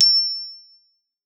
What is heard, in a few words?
GLO E6, GLOCKENSP; Xsample VOL-4